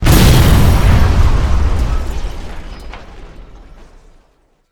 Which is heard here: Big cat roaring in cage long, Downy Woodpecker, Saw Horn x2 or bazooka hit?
bazooka hit